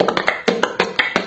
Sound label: create, Beatbox, Drum loops